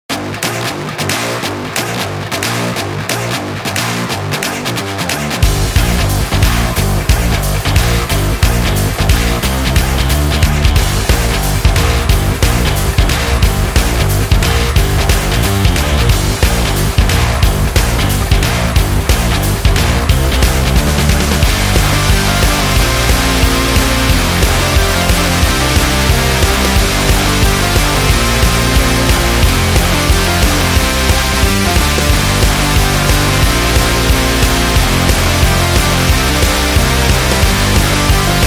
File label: Indie Rock Fun